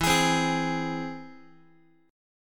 E Augmented E down Guitar 4A 4E 5A 5D 5G sharp 6C sharp; strummed